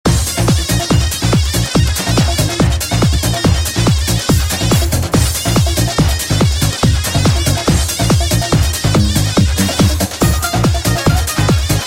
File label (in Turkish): halay davul zurna folklor kafkas; oynak zil sesleri